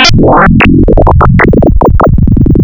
FM Simple Sine High, wavetables, Frequency Modulation; Architecture 32